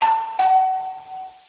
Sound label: Ding Dong